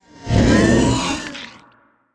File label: spider misc